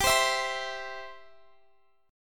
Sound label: G 6th Suspended 4th G; strummed